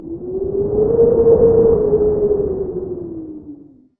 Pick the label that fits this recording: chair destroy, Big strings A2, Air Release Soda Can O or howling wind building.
howling wind building